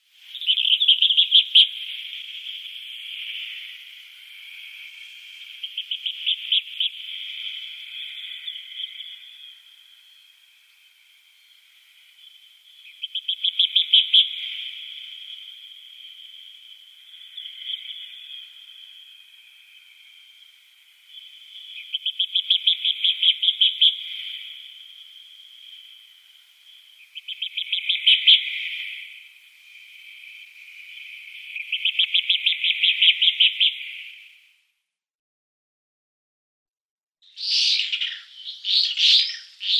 White-rumped Swift

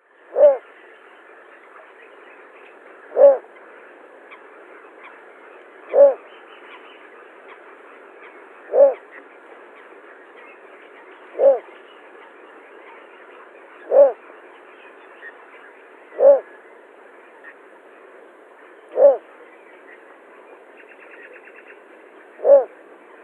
Little Bittern male display

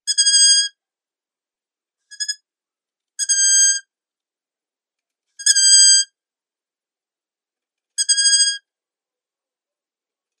Long-eared Owl downy Young

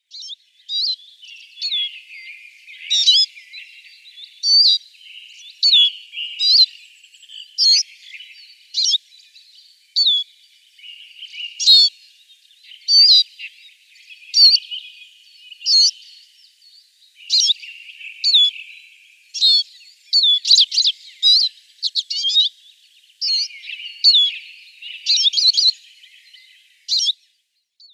Siskin calls

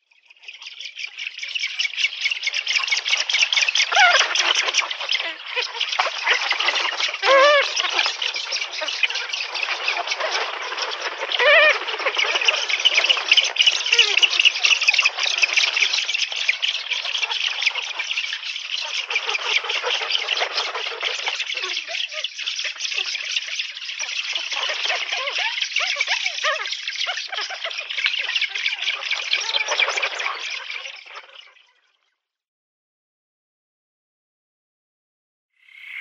Eurasian Spoonbill calls colony

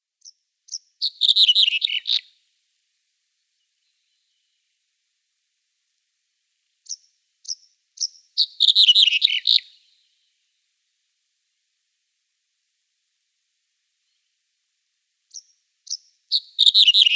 Red-headed Bunting song

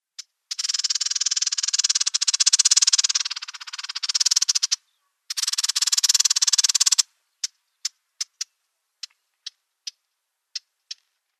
Eastern Orphean Warbler calls

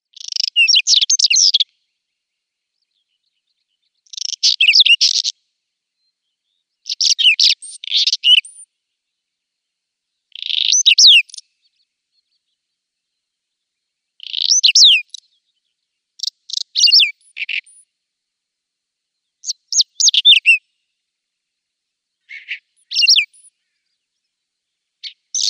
Whinchat song

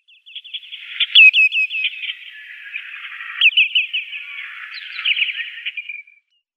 Lesser Yellowlegs calls

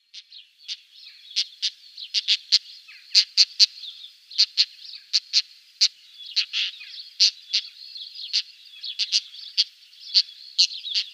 Pied Wheatear calls

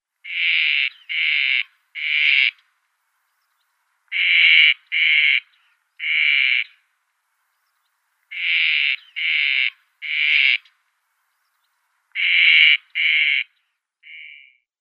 Southern Grey Shrike calls

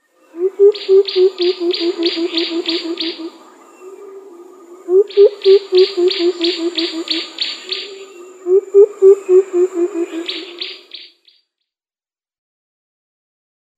Senegal Coucal display

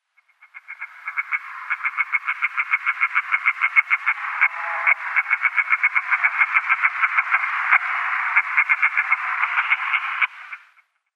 Sociable Lapwing female alarm call

